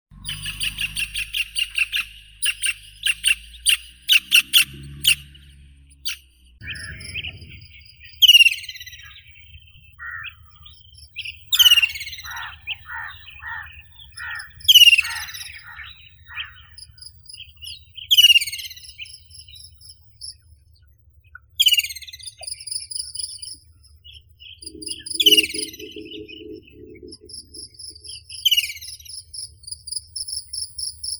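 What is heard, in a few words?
black-capped kingfisher, Halcyon pileata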